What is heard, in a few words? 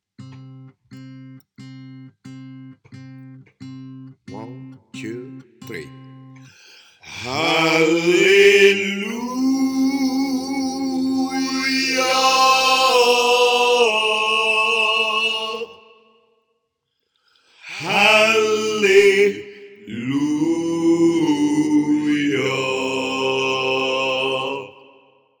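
choir part tenor, Chorale Gospel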